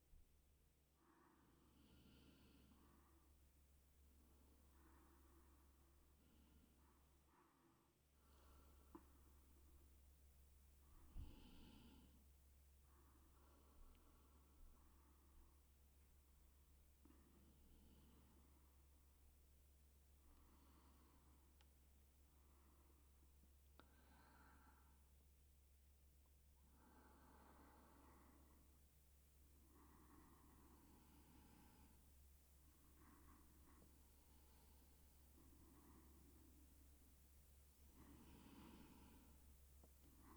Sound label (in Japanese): 特典トラック 寝息(右側)